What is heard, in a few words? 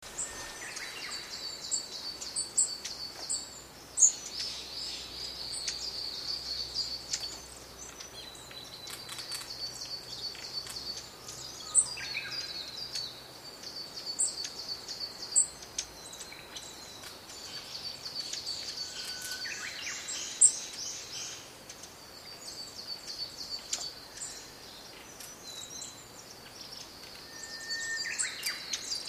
chorus